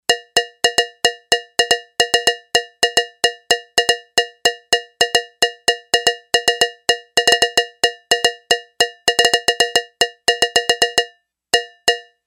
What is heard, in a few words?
Cow Bell, Yamaha DX11; Factory Preset Voices